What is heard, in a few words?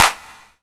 Claps & Snares; Vengeance Samples; VES2